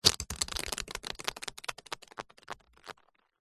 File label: leisure game spinner plastic on card push spin long